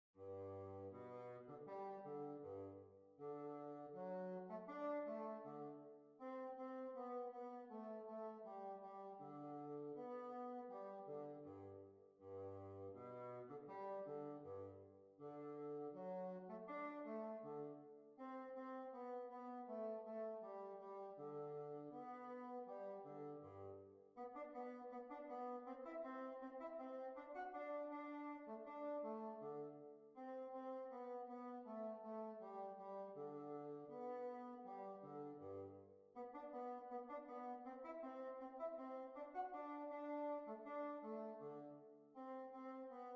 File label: Hornpipe